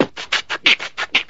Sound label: blood prints, Beatbox, Drum loops